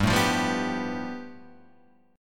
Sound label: A 7th Suspended 2nd Sharp 5th